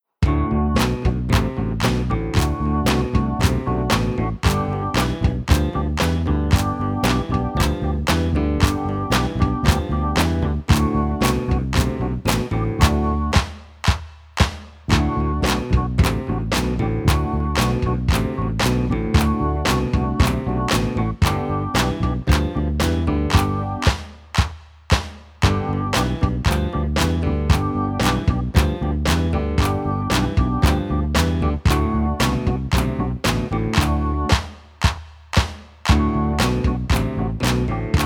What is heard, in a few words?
This is My Face Instrumental